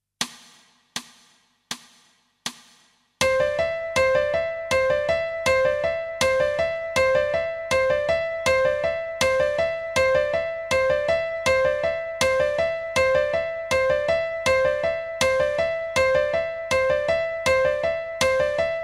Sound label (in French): doubles croches croches; rythmes faciles